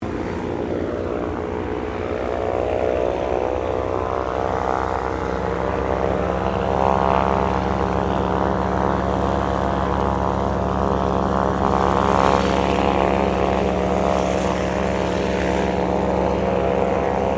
AIRCRAFT DOUGLAS DC3 PREFLIGHT; Qantas 1942 DC3; Airplane Sound Effects; Airplanes (Sound Effects:Vehicles)

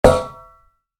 Shovel, Impact; Dull Hit; Yard Tools; Yard Tool Sound Effects; Yard Tools (Sound Effects:Tools); Stuart Duffield

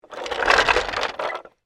Cart Roll By Fast, Industry, Cart, Roll, By, Fast; Rolling Sound Effects; BlastWave

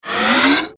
Whoosh Airy Musical Ascend; Blastwave; Whoosh Sound Effects

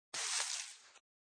Flwrs Pik Up, Flower bouquet picked up off floor.; Scrapes; Sound Effects:Objects

